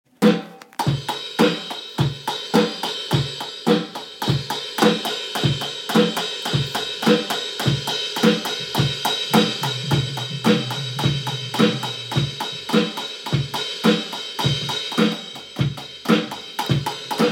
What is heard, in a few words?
live druming; hillsy